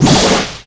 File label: kraken attack